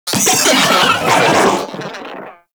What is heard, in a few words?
Vocal Death, Enemies; Warframe; Moa